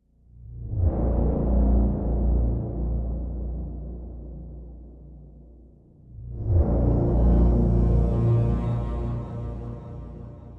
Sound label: Synths Only